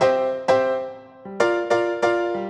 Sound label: Latin Lounge Piano